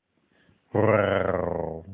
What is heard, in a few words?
Llamas growl